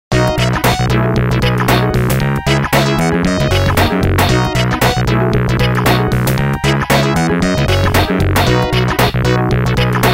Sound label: HappyPoppy, ringtones; Yamaha SMAF demo ringtones